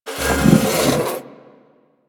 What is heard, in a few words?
Classroom Chair Drag; Ambience; Warframe; The New War; Quests; Zariman